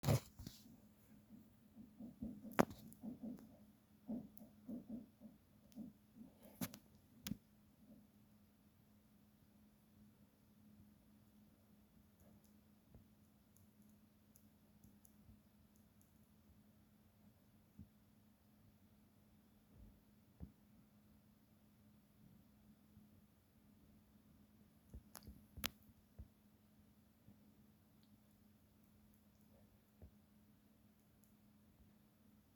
trommelen

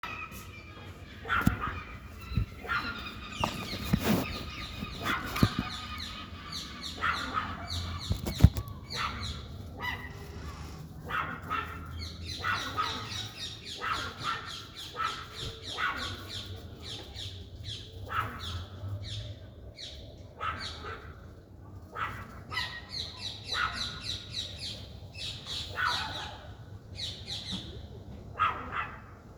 hond

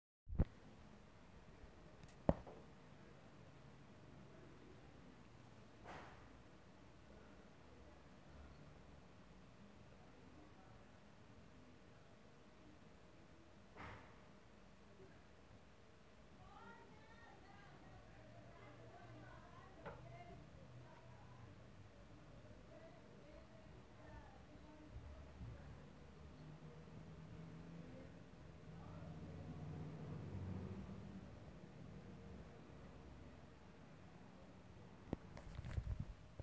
zo schreeuwt ze heel vaak

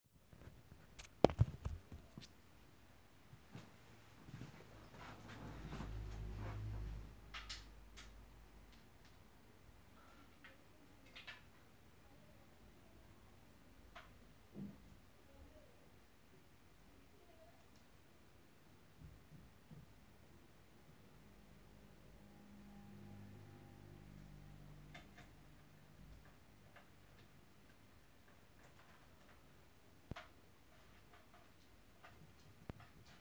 rennen stampen

